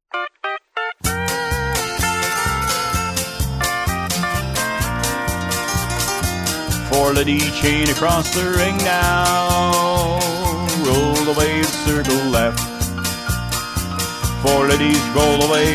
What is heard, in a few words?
vocal